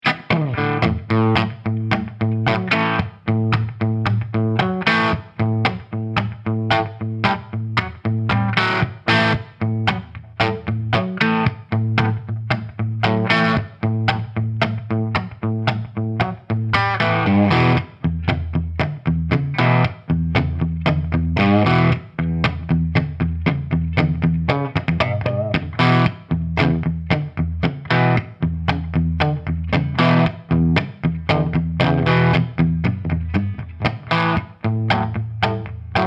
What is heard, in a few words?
Gibson Les Paul Marshall 1992 77 Celestion Classic Lead MS57